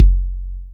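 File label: 808 kick, Boss DR660; drum machine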